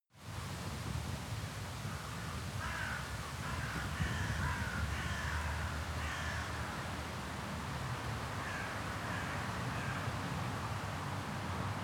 traffic wind decreasing

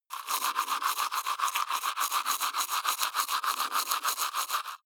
teeth brush wet open molars

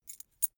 key ring small put down

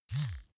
razor smooth single

